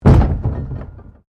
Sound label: PLASTIC BARRICADE HIT